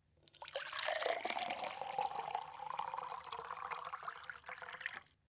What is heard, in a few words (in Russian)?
zvuk nalivanija vody v stakan